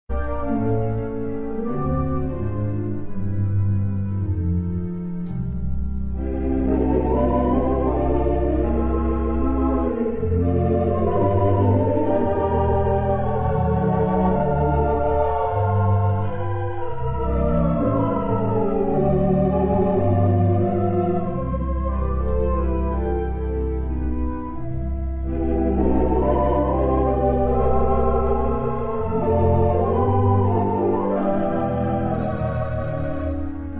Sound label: theatre organ